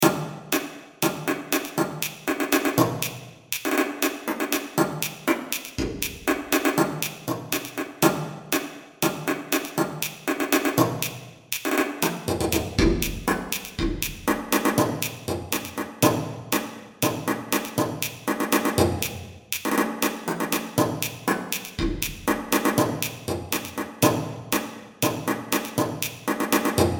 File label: irregular rhythm